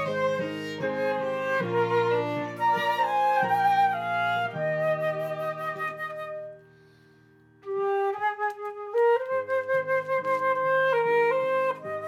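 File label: Viola C minor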